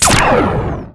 shrike blaster, vehicles; Tribes2

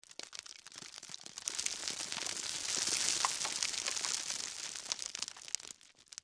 gravel, environment; Tribes2

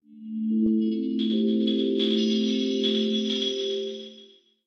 wow level5 pouring; Tribes2; Bonuses

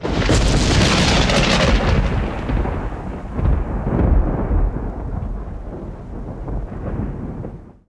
melody; Tribes2; environment

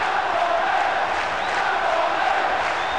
crowd

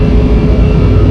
bomber engine; Tribes2

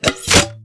grenadelauncher reload, weapons; Tribes2

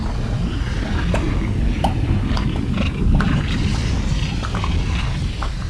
lava hostile, environment; Tribes2

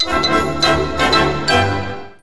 mario 6 notes

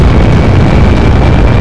bomber boost; Tribes2

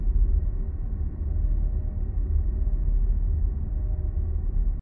shell hum; Tribes2; t2-mapper

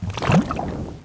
lava bloop; environment; Tribes2

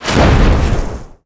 spell explode; Tribes2; ironsphererpg

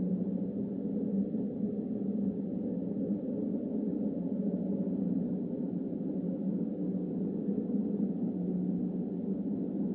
wind loop

